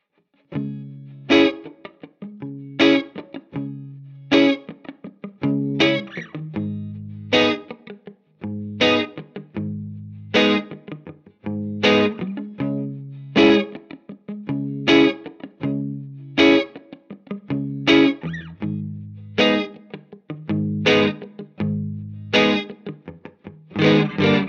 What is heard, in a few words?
Firebird Neck Clean Fender Deluxe, pickups; Revel